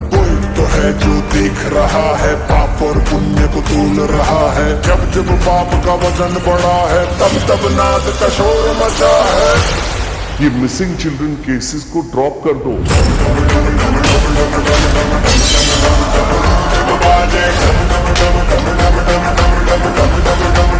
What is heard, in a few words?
Slow Reverb Version Ringtone; sector 36